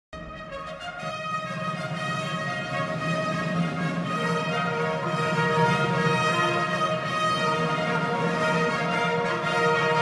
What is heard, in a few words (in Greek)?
Μασσαλιώτιδα Εθνικός ύμνος Γαλλίας